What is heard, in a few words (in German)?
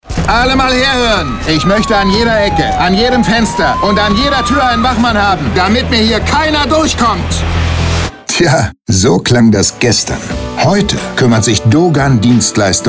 Musik; Dogan Dienstleistungen